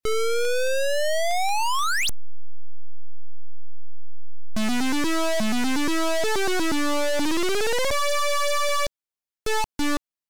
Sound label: Good Luck Beeper; Split Personalities